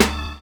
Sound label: FILL; Univers Sons - Kitch 70s